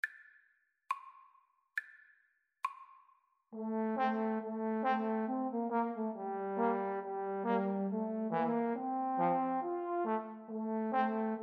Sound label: rose, Trombone Trio; schubert